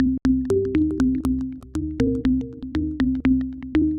Modern Synth, Synth Textures; Sonic Foundry (Sony Creative Software); The Electronic Music Manuscript Collection

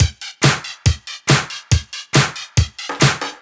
Kick Loop, 140bpm; Trance; Kick Loops; Zero-G Trance Inducer; Drum Loops 140bpm; 90 s Sample CDs

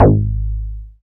BAS FM DX-7

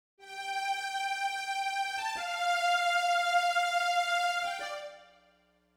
Smooth Groove Strings, 70s Analog Funk

